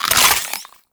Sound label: Infested Beam Pistol Reload C; Warframe; Weapons